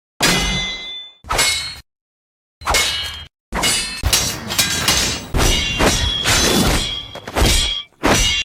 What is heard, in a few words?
swords clash